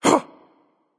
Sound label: surprised, voicepack; smite; jorm